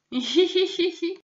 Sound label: hihihi